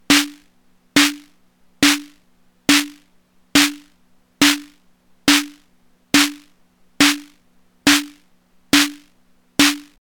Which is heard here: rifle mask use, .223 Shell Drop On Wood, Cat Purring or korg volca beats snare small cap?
korg volca beats snare small cap